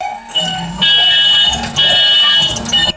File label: electric hand trolley lift motor and warning beeps; vehicles